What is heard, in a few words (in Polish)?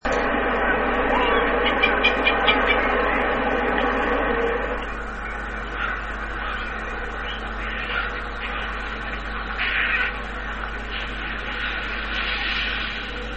Birginiak - Polysticta Stelleri, głosy; tracz; głosy innych traczy